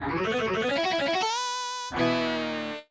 Guitar; Go-Rock Squad; pokemon ranger nintendo ds

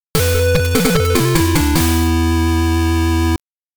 Performance End (Game Over); Nettou Samurai Spirits; Dead Heat Fighters

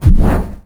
Wizard Ether; Inotia 3

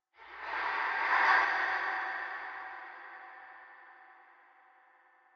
Creepy Giggle

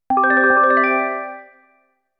Equipment Level Up; dot hack

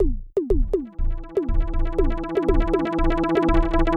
Electro

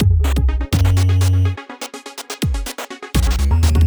Stage back Intro; invercity

